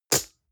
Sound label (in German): gel dispenser air release smack, badezimmer